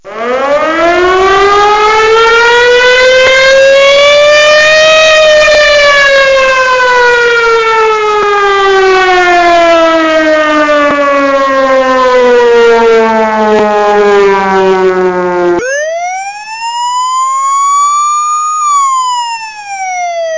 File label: Extra Loud Siren Ringtone; ringtones